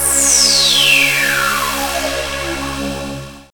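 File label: SYNSWP, synth sweep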